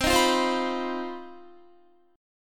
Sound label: C Minor Major 7th Double Flat 5th C down Guitar 4F 4A 5C 5G 5C 6E; CmM7bb5 strummed